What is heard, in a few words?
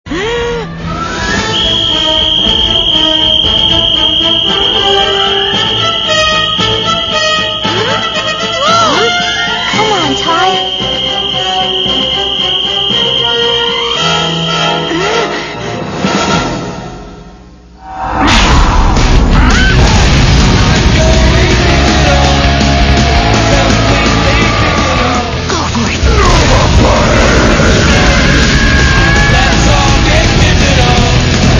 whistle